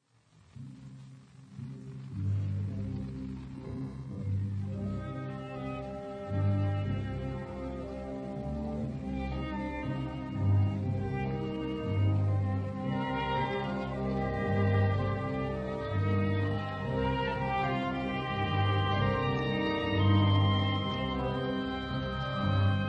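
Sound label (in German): Fantasie für Klavier und Orchester; Busoni; Indianische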